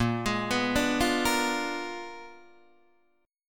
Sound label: Bb 7th Flat 9th Bb down Guitar Open D